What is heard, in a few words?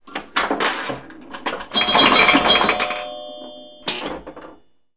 pinball